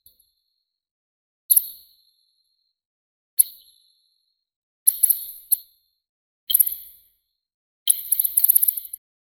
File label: button click